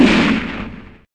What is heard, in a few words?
explode; 360shooter